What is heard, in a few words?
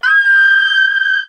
scream; Voice